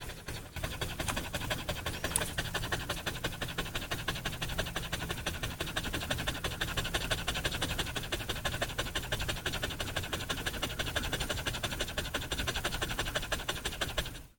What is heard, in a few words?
dog panting breathing fast